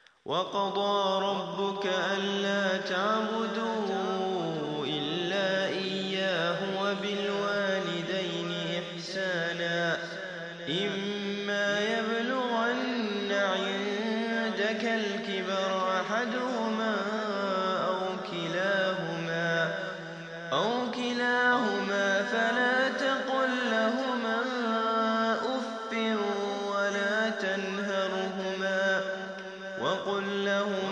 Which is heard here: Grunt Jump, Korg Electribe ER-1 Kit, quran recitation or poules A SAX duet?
quran recitation